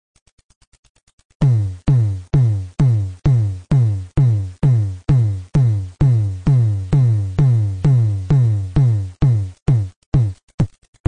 tom, synsonic

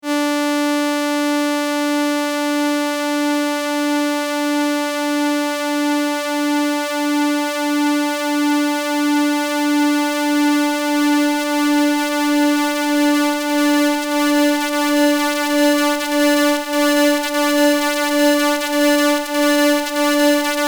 saw anim; tellun

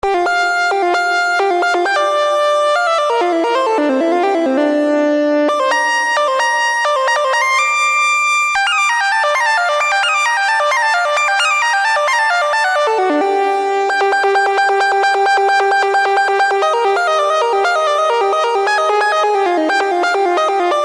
Pro-One triangle; tellun

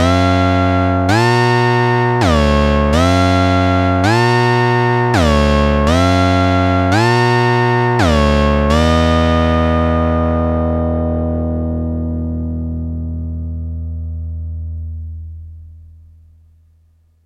2 vco + sub, modsynth

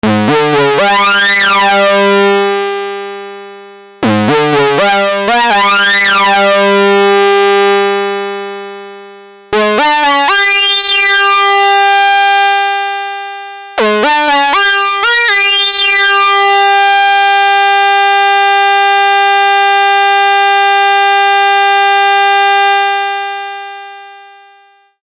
hard sync raw; tellun

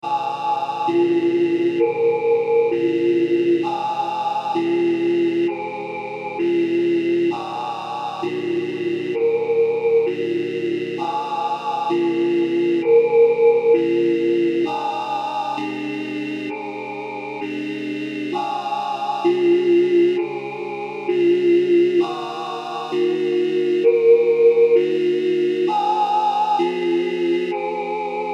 String Filter Voc; tellun

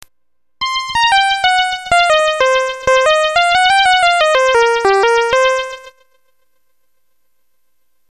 filt lfo

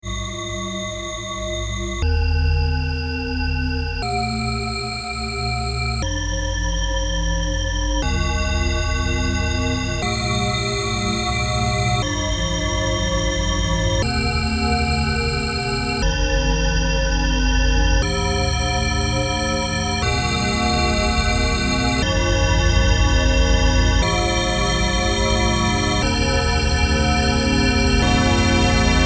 String Filter Pink; tellun

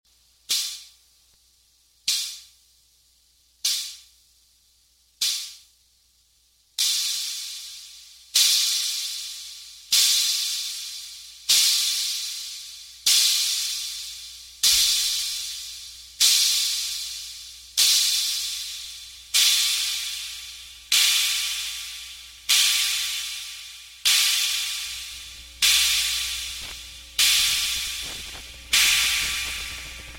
with noise; dr110

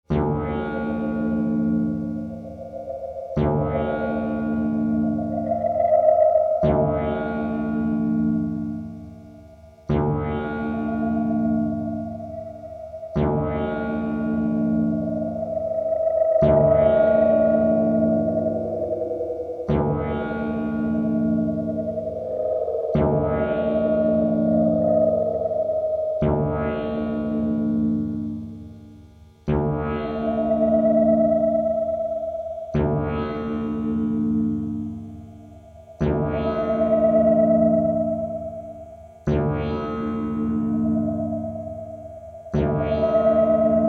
feedback; tellun

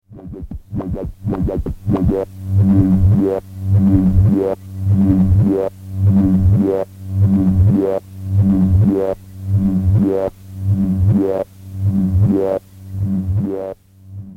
panning

